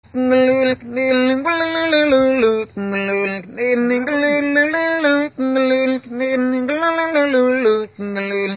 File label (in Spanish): guitarra humana